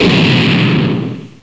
Fire

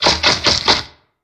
Move

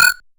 Cursor

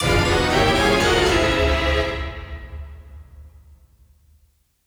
Curse

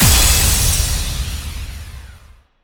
Battle

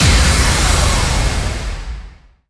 Battle

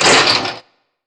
Open

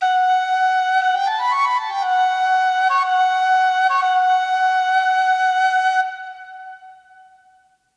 Musical

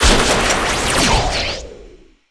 Chain

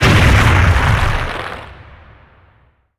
Explosion

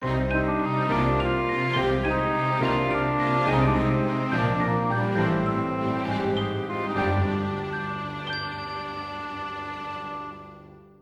Gameover

